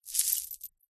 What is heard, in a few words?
jewelry necklaces pick up from carpet; household